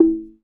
E4 tongue, Tongue Drum

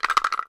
kpoko, Kenya Kpoko Kpoko

